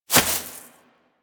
Rodent Hop Step Rear Grass; Warframe

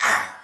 Pain, Soldier Male; Dialog; Barks; Battle; Sinecure

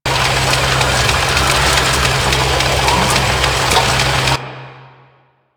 Garage Door Loop; Ambience; Warframe; Venus Open World

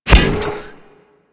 Step Default

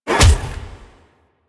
Melee Heavy Attack Slam, weapons melee whooshes; Warframe

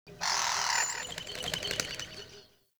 Wetland Forest Day Dove; Ambience

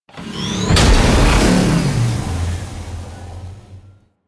Call Backup Cooldown; Enemies; Abilities; Warframe; Corpus; Arachnoid Camper Terra

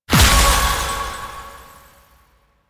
Bleeding Shards Impact; Warframe; Citrine

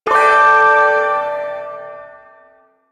Shawzin Pent Maj Chord; Warframe; Duviri Ersatz Shawzin

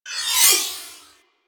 Swarm Cannon Projectile; Warframe; Weapons; Corpus

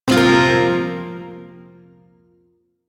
Shawzin Hirajoshi Chord; Warframe; Duviri Shawzin

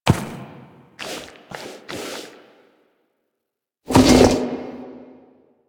Ram Idle Lick; Duviri; Animals

